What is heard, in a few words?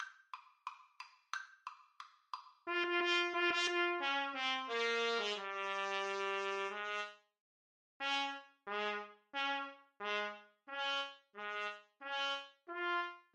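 radetsky march trumpets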